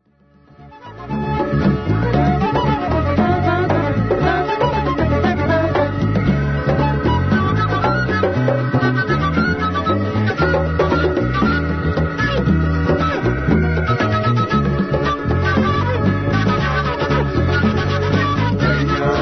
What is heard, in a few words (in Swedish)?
flöjt solo